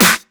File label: Snares & Claps; Vengeance Samples